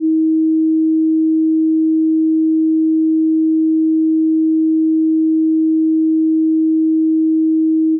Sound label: type beat; Trap